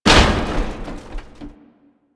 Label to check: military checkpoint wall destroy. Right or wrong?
Right